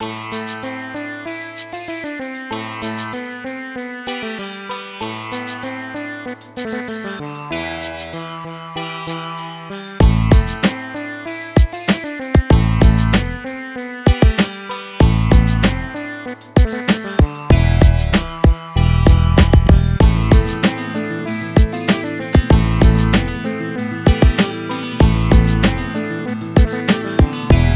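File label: 096 A minor, Hip Hop Exotica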